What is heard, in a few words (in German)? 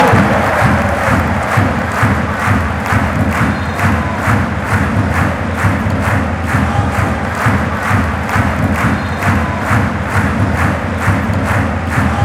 hypnotische klatschen